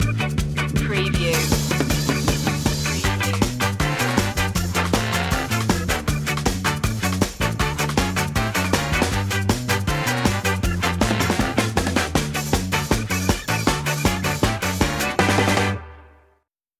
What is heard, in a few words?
ska jump